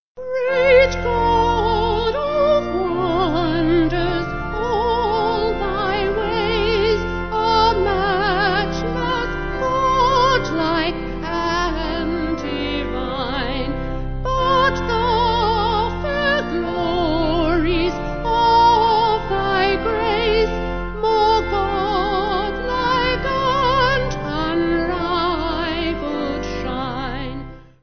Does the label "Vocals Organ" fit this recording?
Yes